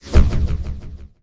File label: Menu Fail Error